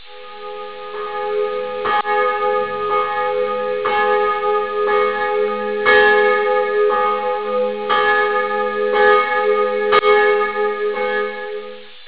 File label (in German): glocke, glockensound; pfarre